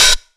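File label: 125 drum 2 R, Fresh Disco House; USB Soundscan vol.20; AKAI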